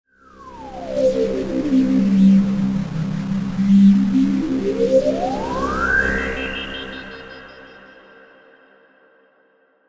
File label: Synthetic Swell